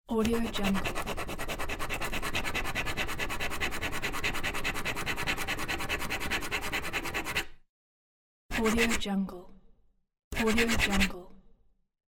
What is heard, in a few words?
pen scribble